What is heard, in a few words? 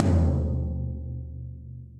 Tom Floor Low Loud Squashed; drum hits; Ableton; Factory Packs; Session Drums Club; Standard Hits